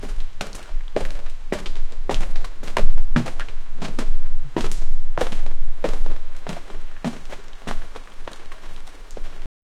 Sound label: feet running away